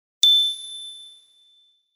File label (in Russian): akcent bleska